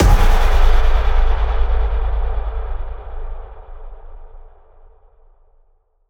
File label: Impact; Cymatics; Future Bass Starter Pack